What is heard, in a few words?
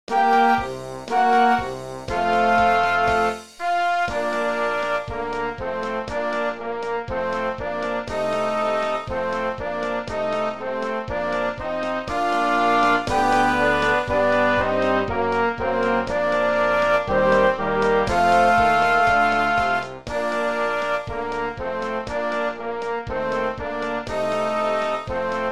Victors March playback